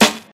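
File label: original steel snare drum sound A key, acoustic snare tuned to the A note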